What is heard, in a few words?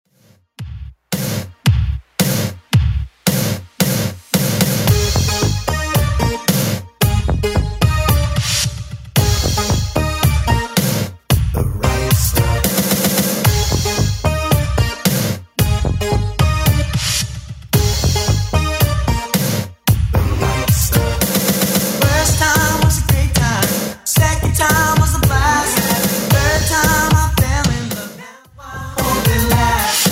80s Funk Ext Redrum 112bpm